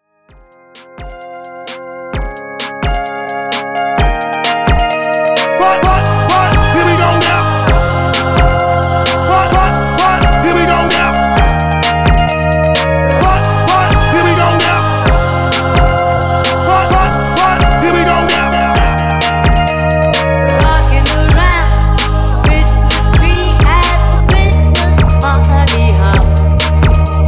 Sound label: Hype, 130Bpm